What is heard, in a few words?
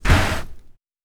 Sand gravel